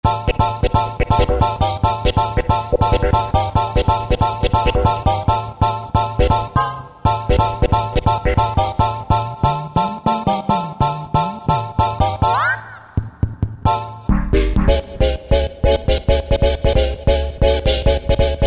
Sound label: Rave, Roland V-Synth; Factory Patches; 251 Rave Cave